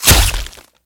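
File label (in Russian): одиночный точный удар по цели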